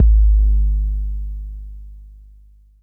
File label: BD 808 Noise